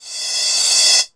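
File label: Yamaha RS7000 hihat cymbal, Drum machines; Cymbals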